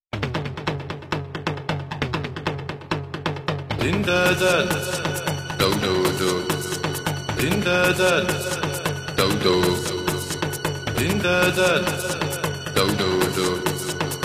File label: music MW AACplus mono; DRM; audiotest